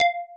SIM Toolkit General Beep; i OS; iOS10

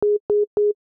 Factory Reset Starting; homepod OS

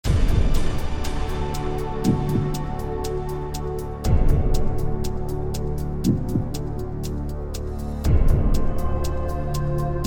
Clock; Millionaire Soundtrack; US Clock (2008)

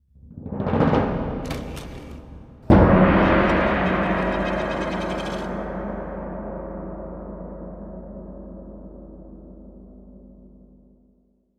success; KDE Borealis

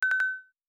Retry Haptic; i OS; iOS10; nano

